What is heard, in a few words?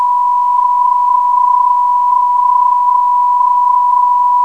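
VRS 369kHz, non-directional beacon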